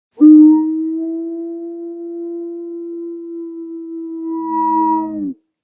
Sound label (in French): loup cri